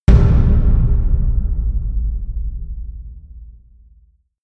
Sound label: huge tom hits; music elements; percussion